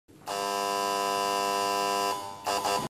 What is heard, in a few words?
Lotto Sound